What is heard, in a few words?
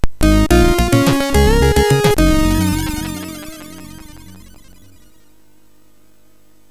Bomberman C64, MOS6581R4